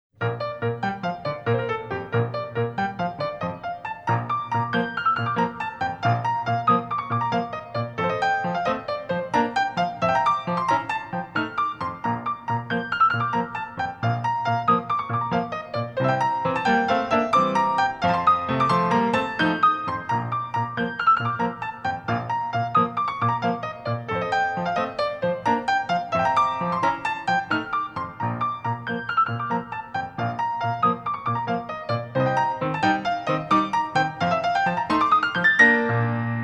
Soubresauts and échappés sautés to 4th position continuous version